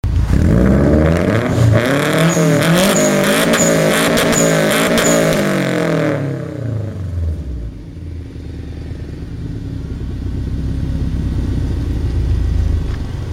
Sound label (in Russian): zvuk moschnogo vyhlopa